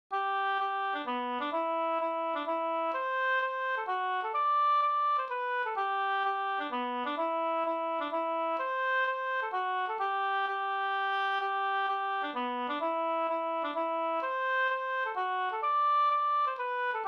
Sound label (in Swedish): hambopolska